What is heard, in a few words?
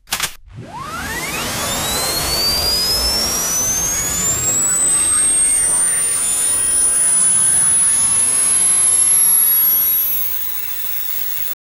the sound of a laser beam charging up before firing